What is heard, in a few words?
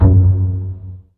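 DOUBLEBASS pizzicato F#2, Virtuoso Strings; ILIO; Kirk Hunter Virtuoso Strings VOL-2; 90 s Sample CDs; 5DOUBLEBASS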